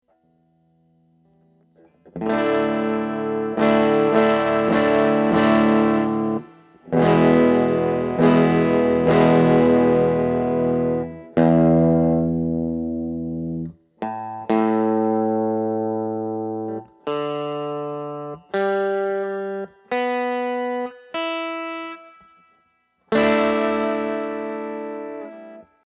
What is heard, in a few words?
strum, unwanted guitar buzzing distortion